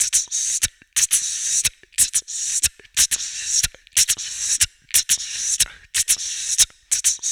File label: VOC DRUM, MOUTH PERC; Best Service Hyper Dance